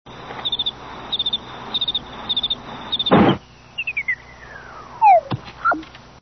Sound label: crick